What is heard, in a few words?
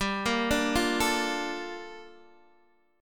G Minor 9th Guitar DADGAD